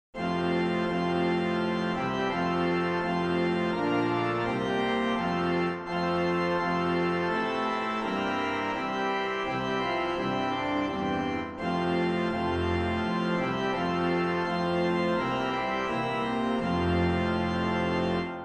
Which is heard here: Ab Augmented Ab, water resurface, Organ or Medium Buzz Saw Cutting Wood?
Organ